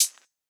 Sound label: Vintage Hihat, closed; trap; Hihats; Cymatics; Trap Starter Pack